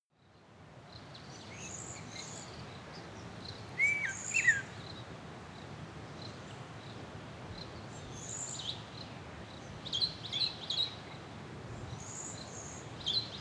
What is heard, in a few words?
african bush morning river birds